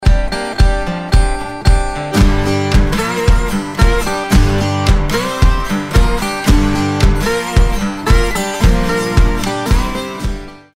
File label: Country Blues